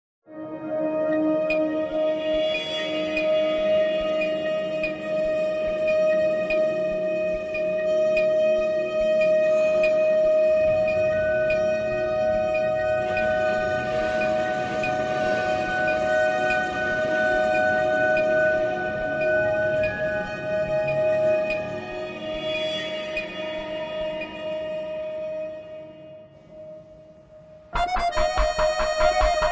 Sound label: Alpine Ambience, Underscore